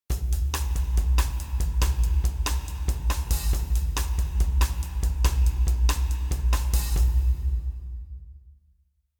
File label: Percusion Peak EQ; RaySpace